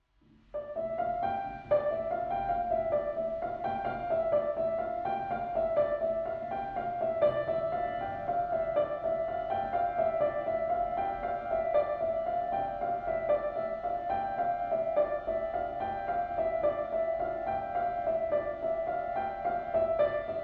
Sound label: Piano